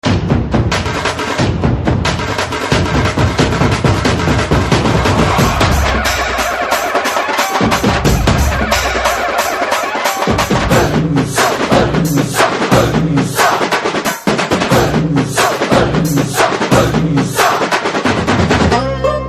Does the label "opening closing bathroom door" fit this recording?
No